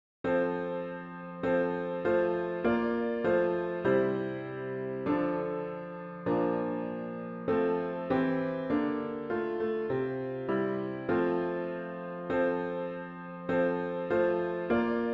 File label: piano only; Lo How a Rose E'er Blooming